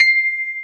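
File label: piano C6; AKAI S-Series CD-ROM Sound Library VOL-2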